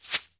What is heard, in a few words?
Hail Of Arrows Fire; Ranger